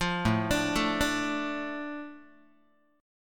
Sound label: A Suspended 4th Sharp 5th, A, Guitar; Asus4#5 arpeggiated; JGuitar